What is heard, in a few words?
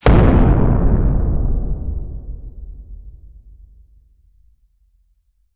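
a dramatic boom that trails; fight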